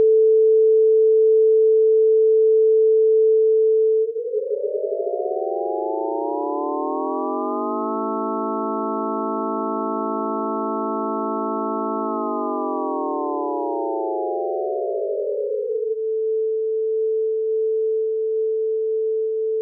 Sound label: sin 440